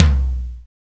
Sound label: Toms, Real